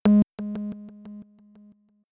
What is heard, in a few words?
Fireflies Synth